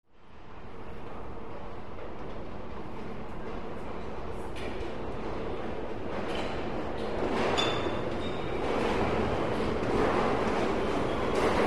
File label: Subway Platform Trai, subway